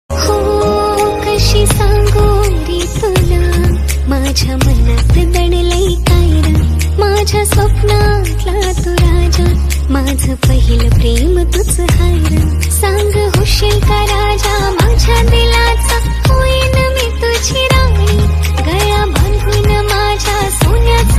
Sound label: Marathi Ringtone